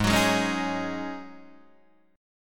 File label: G sharp 7th Suspended 4th G sharp, Guitar DADGAD